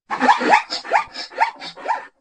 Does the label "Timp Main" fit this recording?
No